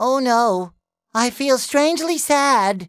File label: vocals